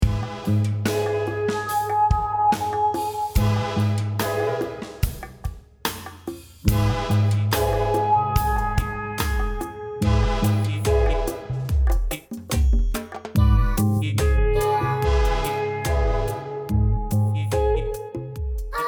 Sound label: slo funk train